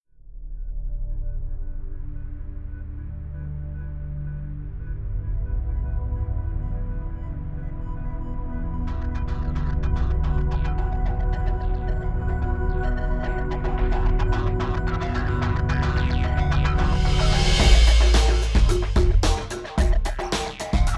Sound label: Electric Gtr Slide